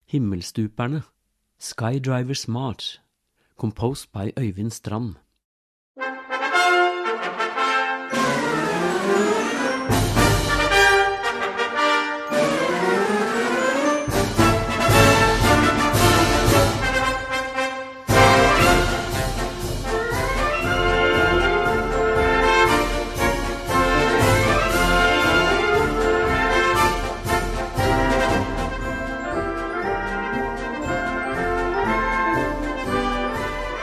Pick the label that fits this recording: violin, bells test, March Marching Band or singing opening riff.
March Marching Band